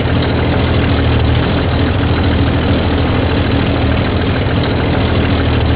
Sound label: Bf 109 Internal, Aircraft; combatfs